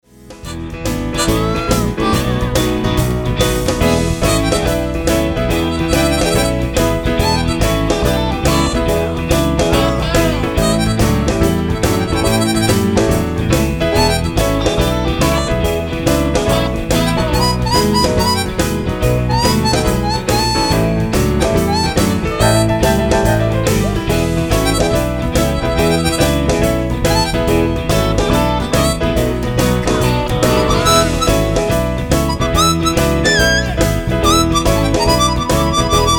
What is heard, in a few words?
Blues